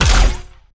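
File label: Laser gun Flanger Fire Heavy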